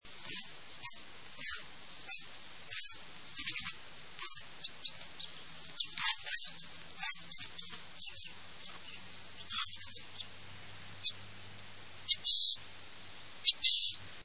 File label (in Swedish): graflugsnapp